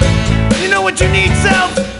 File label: Cowbell; Happy Feet Two; NDS